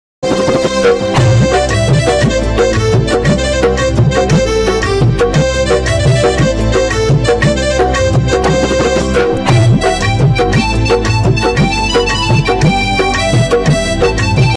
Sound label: piano cover song instrumental